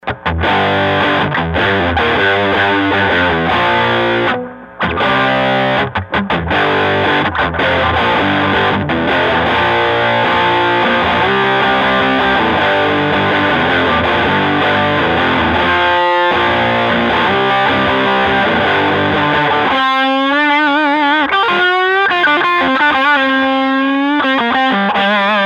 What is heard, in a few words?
90s rock; rat2p